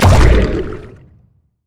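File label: lets bounce small; LoL; zac base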